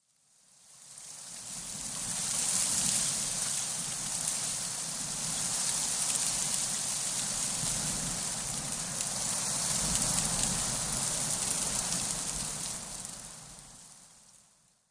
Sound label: Leaves rustling